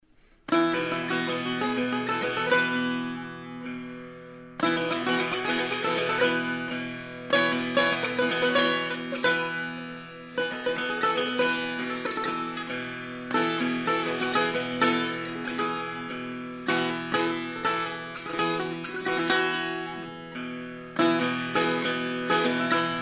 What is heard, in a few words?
persian setar